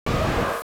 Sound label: Boss Scream; LOZ